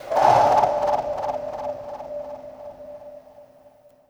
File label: TRAIN TRAC; Distored Reality